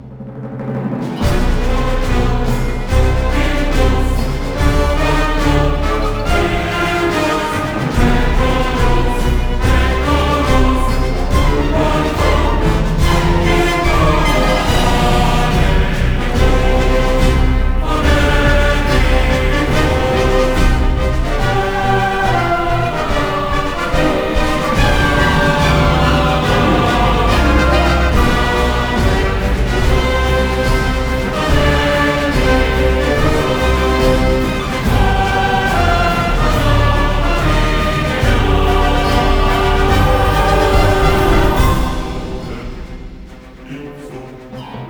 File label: Loop; Planetary Annihilation